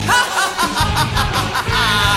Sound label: hahahahahaha